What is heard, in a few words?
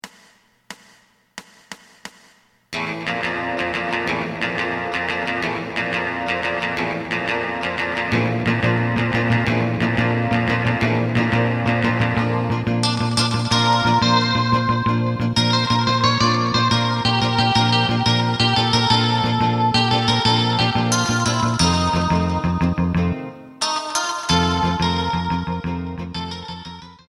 drum